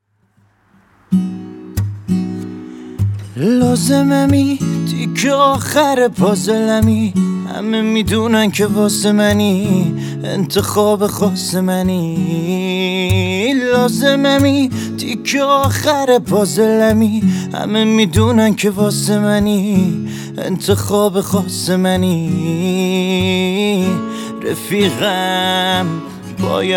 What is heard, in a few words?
Guitar Version